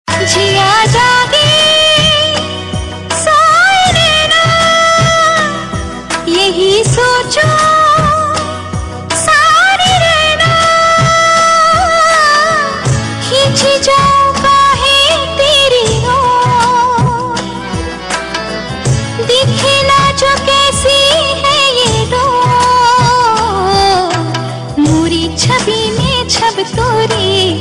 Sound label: With Female Version; Zee TV; Choti Bahu (Season II)